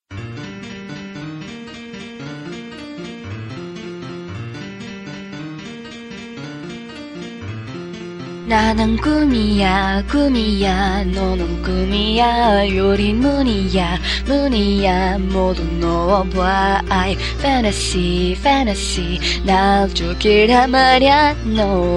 piano

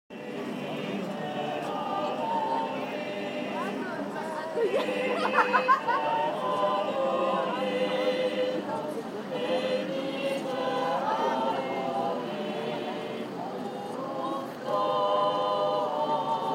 cologne in sound

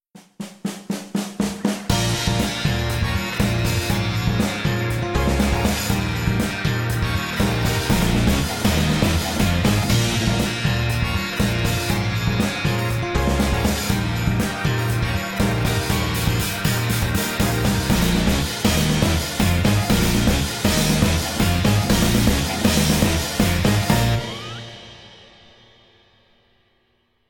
backing track

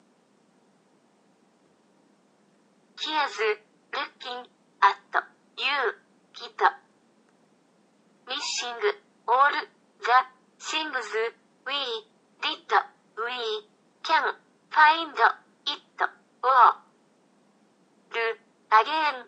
karaoke version

